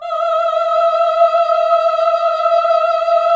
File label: VIB F E5A -R, Symphony of Voices; Spectrasonics